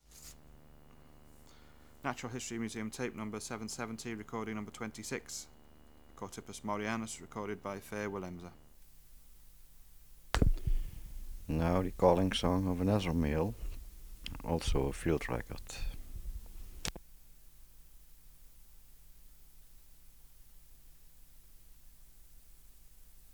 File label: Chorthippus moreanus